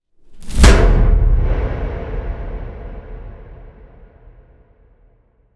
big impact attempt

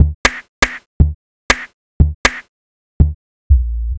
Technic Beat, Electronic Beats; Sonic Foundry (Sony Creative Software); Myths of Technology

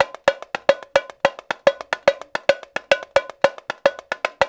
RIO, Rio Batucada; Spectrasonics; Supreme Beats; World Dance

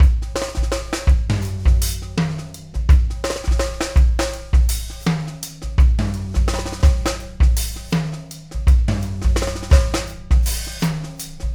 Beat, Loops

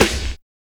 HIP SNARE; Drums In Your FACE!

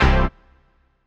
HOUSE, House Dance; 90 s Sample CDs; Best Service; Dance Mega House Dance

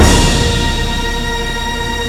super hit; Rubber Chicken Software Sample Bank

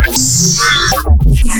Noisy Glitch

